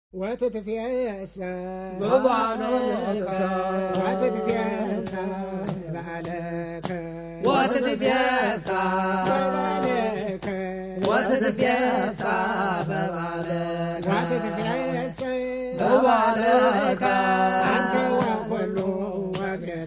watatfesah baba aleka chant dans de yom kippour

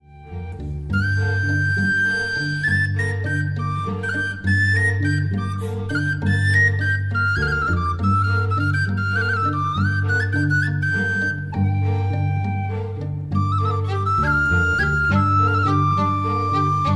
fantaisie roumaine no1 instrumental

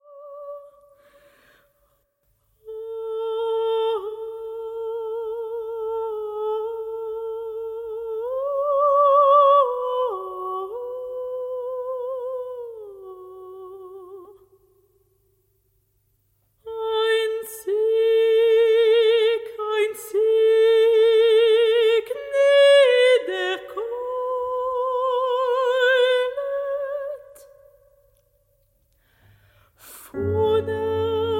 chant de r paration

